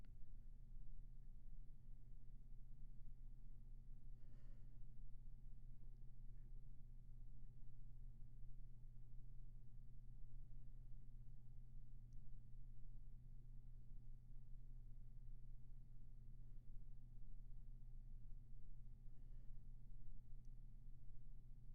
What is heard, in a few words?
Room tone